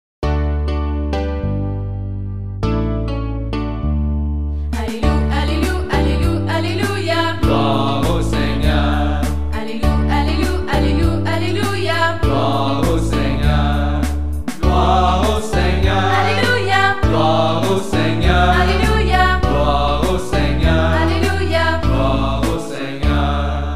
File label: alleluia chant; Alléluia 2